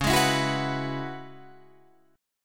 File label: Db Minor 11th, Guitar, Drop D